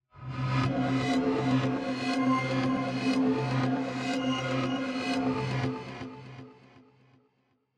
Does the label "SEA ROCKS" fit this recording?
No